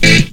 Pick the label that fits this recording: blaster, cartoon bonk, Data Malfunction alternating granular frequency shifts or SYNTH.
SYNTH